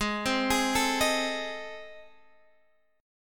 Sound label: A Minor Major 7th Flat 5th